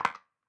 Click Wooden

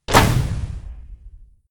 Explosion

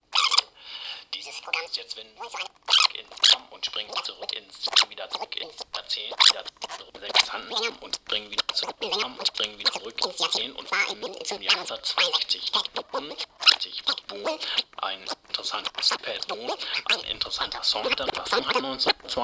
Maltreated Tape Recorder

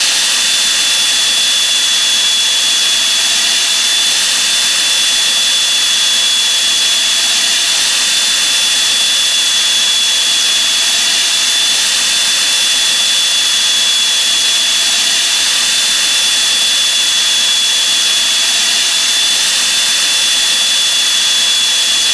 T 80 Turbine Sound

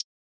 Click

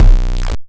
Bass Drum Plastic Penetration

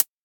Synth Closed Hi Hat

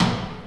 Snare Hit

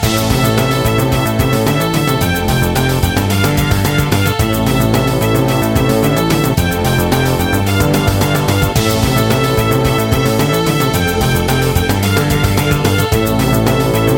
Loop Arcade Space Shooter

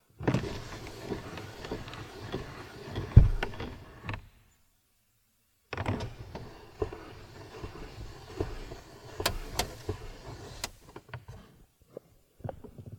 Car Window Opening And Closing

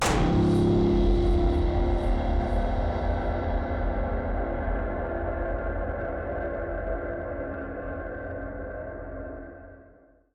Broken String

